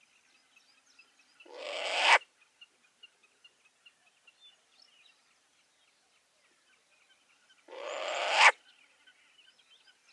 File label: Short eared Owl Asio flammeus